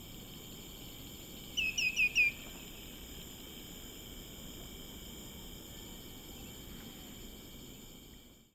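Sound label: nocturnal flight call very close doppler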